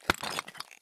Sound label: walk tile; Escape from Tarkov